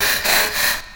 FX; AMG Megabass REMIX! VOL-2

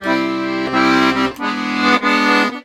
Accordions; USB Soundscan vol.40; AKAI

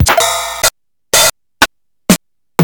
Siel MDP-40

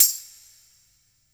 TAMB WET; Percussion; InVision Interactive; Percussion Slam

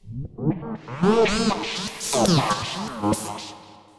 Noise, Processed Noise

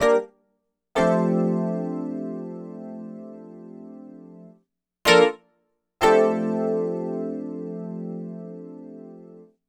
EP, Keyboards, Electric Pianos; Sonic Foundry (Sony Creative Software); Mac Money

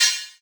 Hats, Elektron MachineDrum SPS1 MKII

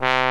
POP BRASS

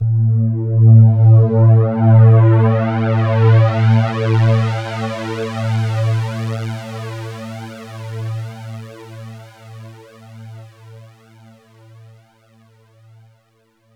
BASS FLY; EFFECTS; Best Service Dream Experience; SF+EFFECTS

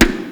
drum; Crypton - DrumBase5000; AFRICNRHYTHM

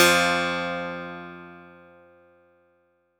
F1, PIANO; AKAI S-Series CD-ROM Sound Library VOL-8

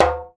JEMB, DJEMBE; Univers Sons; Basicussions

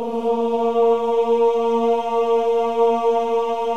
OH A#3, CHOIR OHS; Spectrasonics; Symphony of Voices

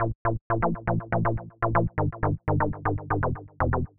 Bass Loop (120BPM); musicradar

